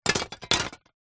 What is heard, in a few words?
plastic shards broken thin pieces set down; zapsplat